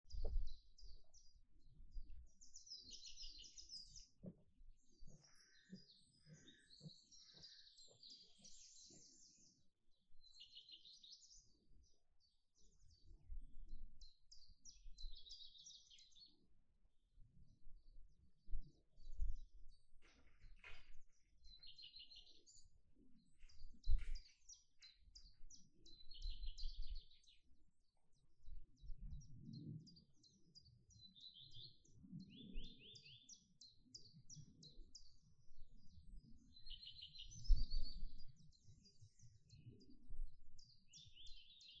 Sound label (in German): garten vogelstimmen; Fleesensee